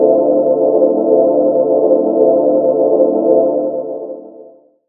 Pad D2, Club Techno; Zero-G

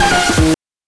BANK2 SFX, NES DPCM; MIDINES

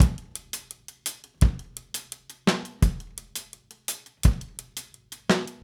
Drums, 70s Analog Funk

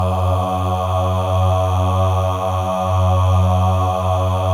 MALE AHS, Symphony of Voices; Spectrasonics

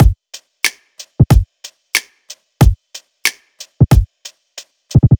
BEAT, Hip-Hop & R&B; USB Soundscan vol.47; AKAI

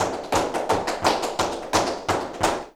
flamenco tapping, jaleo

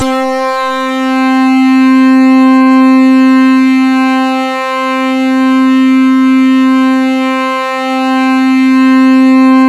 EDGELEAD; MORPHEUS

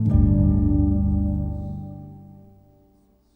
Gong Harp; Sonic Foundry (Sony Creative Software) - Friendly Fire; Single Gongs

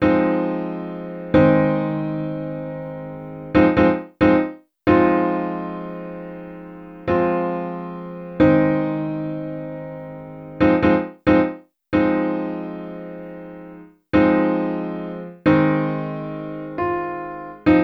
Oldie Pianos, Electro Lounge; Sonic Foundry (Sony Creative Software)

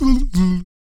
VOC PERC, Vocal Planet Jazz FX BASS PERC

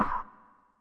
scrub, Yamaha Tenori-On; Scrubkit

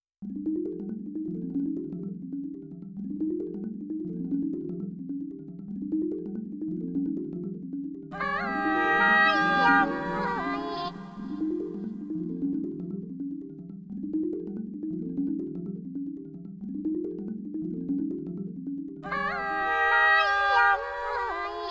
Melody

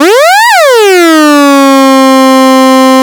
MEMORYMOOG; Big Fish Audio - First Call Vintage Keyboards

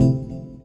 Rhodes, Drum N Bass; Sonic Foundry (Sony Creative Software); Knutrix Drum & Bass

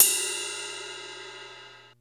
RIDE, DRUM KITS; InVision Interactive; 40 Oz Phat Slammin Hip Hop

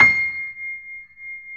C 6, Keyboards Acoustic, GRANDP ST; USB Soundscan vol.17

